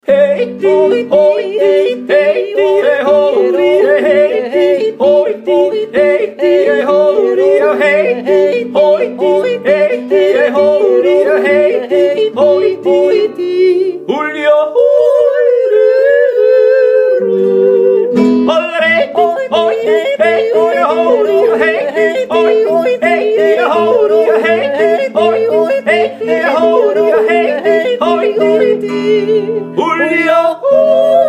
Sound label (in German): kuhdutten jodler